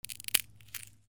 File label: BREAKING